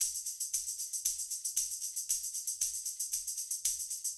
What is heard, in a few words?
Disco Tambourine, 70s Analog Funk; Sonic Foundry (Sony Creative Software); What it Is! 70s Analog Funk